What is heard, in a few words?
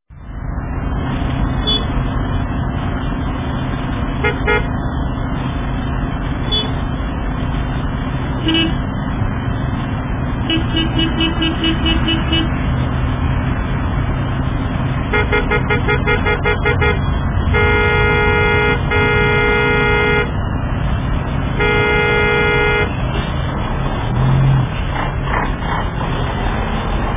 traffic jam; Улица,пробка